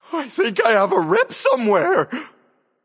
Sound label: Health Low; Plushie Jormungandr